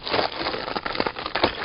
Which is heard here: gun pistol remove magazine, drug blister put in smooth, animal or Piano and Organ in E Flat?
animal